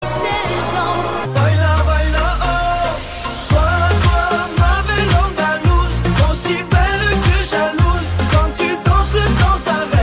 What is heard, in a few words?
new french